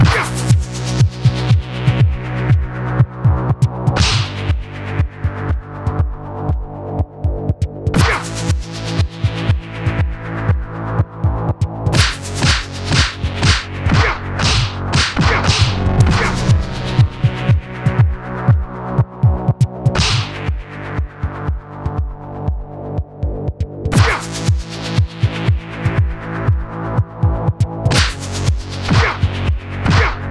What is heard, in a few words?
Weird Kung Fu Loop